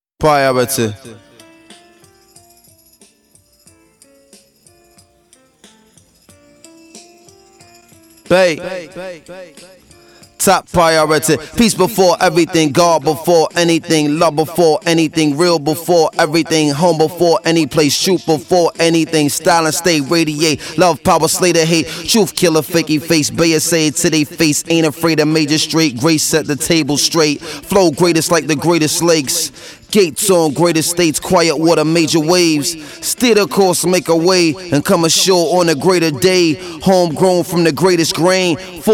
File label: a cappella